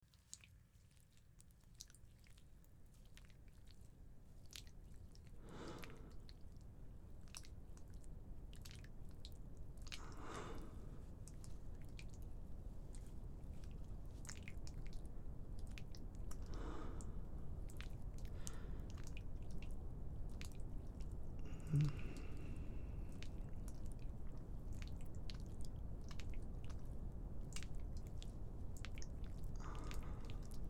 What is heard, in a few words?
plap plap plap w brown noise